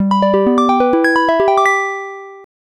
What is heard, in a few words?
Round Start; The Return of Ishtar; Namco System 86 arcade